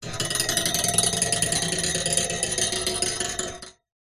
foley metal bolt metal run scrape along small bars resonate